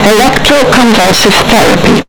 Electro Convulsive, Vox EFX; Zero-G; Total Drum Bass

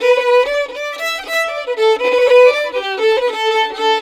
violin, Celtic Flavours

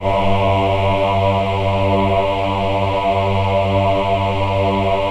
VIB G2, VIBRATO, Voices; Spectrasonics

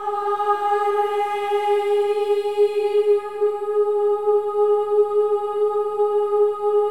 AH EH, Voices; Spectrasonics; Symphony of Voices; AH-EH-EE

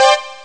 c1 suspended; Amiga Soundtracker Sample Packs

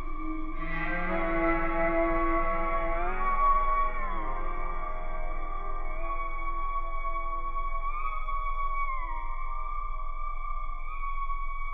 cow strings; Polyend; Loops n trouble

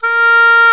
Oboe, Orchestral Winds

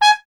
TP G#; Miroslav Vitous; Solo Instruments VOL-1

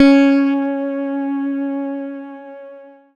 C#, Synclav FM PLUCKED; Big Fish Audio

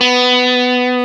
GTR TUBE; Club-50 - Foundations Roland; Dirty; Stack

